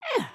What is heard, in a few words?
sleep turn; emotes